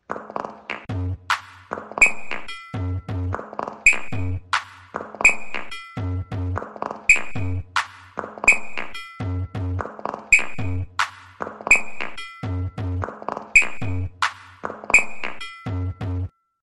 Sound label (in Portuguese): beat peido